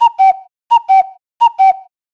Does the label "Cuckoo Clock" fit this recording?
Yes